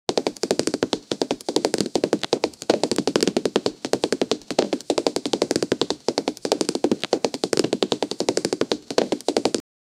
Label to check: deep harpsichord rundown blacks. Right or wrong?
Wrong